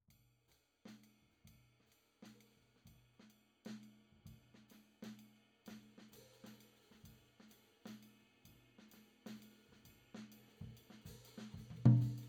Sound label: Tom